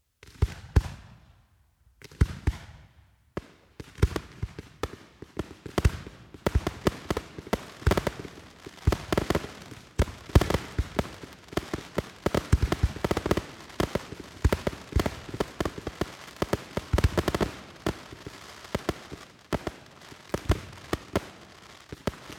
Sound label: fireworks multiple shots and crackling